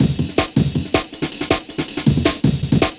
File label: Double Amens